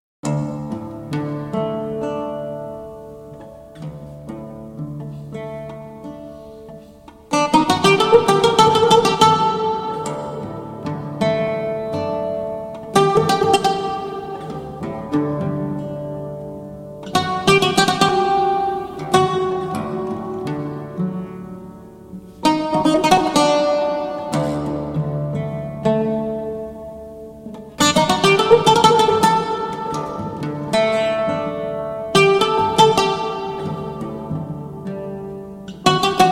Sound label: Arabia Oud Solo Improvisation